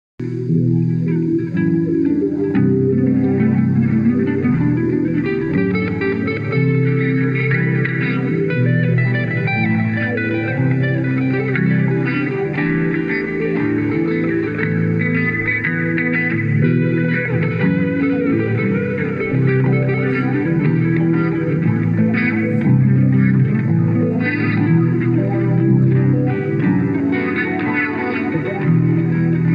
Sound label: Wah Blues